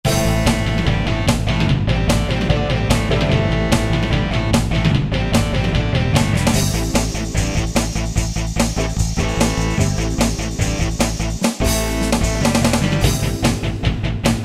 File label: American Hard Rock, Yamaha QY70; Preset Styles